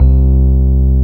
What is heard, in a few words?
piano Rhodes; Sound & Vision; Gigapack I CD 2; PIA E-PIANOS